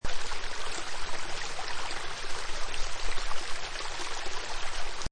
Water Creek Loop, Water stream, medium creek running. Seamlessly looping sound.; Rivers; Nature